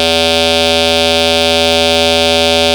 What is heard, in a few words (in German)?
Ton E